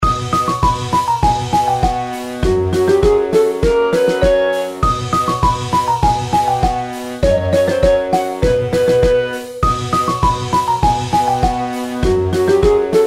additional game; Joker Slot

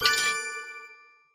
bonus freespin increase number; The Golden City

